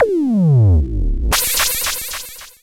Cwejman S1 Torp Bass

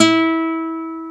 NYLON E 3B, GUITAR; AKAI S-Series CD-ROM Sound Library VOL-8

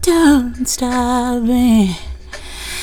FEMALE VOX, Hip Hop

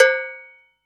Cow B. Med, EMU Orbit-3 drum machine; 300 Drum Machines

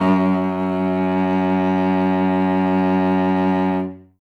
Cello solo F#1; Best Service ProSamples vol.51 - Classic Orchestra 2

